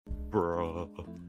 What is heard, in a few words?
techno bruh